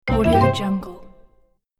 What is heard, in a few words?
super menu open